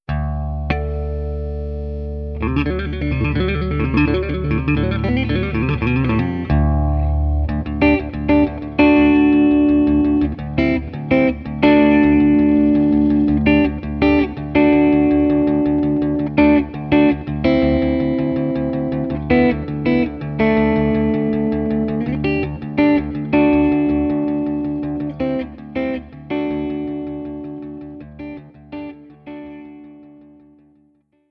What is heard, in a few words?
AC30C2 Normal Channel Clean, amp